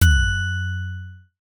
shock freeze chime

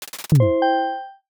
ui crafting complete

